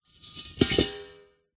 industrial gas canister metal movement scrape bash on gritty concrete surface